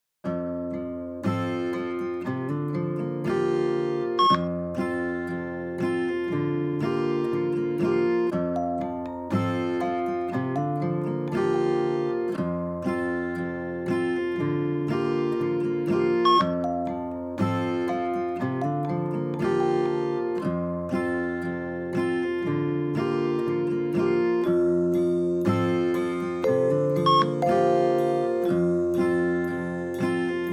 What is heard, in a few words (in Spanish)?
track agua solo